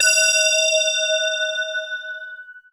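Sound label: Synclavier bell; Greytsounds; F2-SYNCLAV 2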